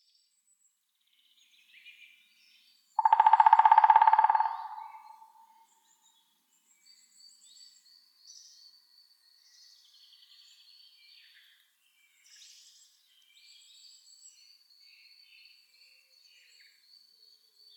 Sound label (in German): Spechte Picidae; Vogelstimmen